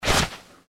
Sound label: feral ghoul swing; npc